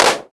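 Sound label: 909 clap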